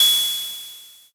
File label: ride cymbal